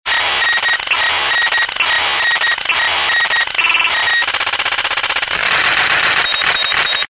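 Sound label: communication coded transmission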